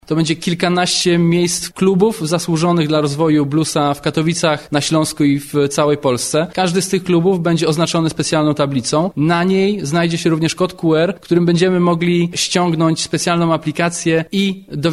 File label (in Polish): blues